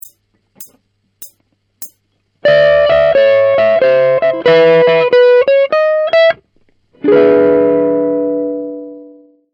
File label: blues